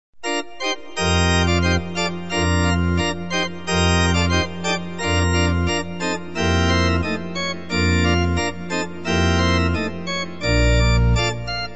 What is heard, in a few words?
polka; 5pieces